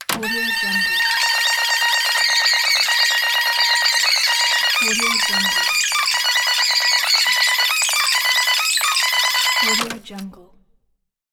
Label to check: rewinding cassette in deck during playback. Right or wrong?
Right